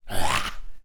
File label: Human Evil; little robot sound factory